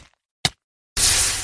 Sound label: lava death